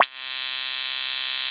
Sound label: Synth Lead; Bass